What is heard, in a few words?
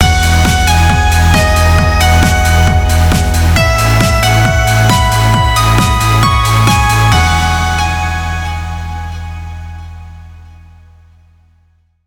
Goal Jingle; Gran Turismo Sport; Transmission Mode; Christmas Extra